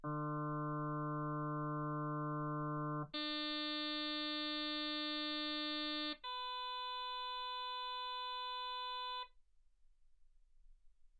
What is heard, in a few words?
harm sinus 48kHz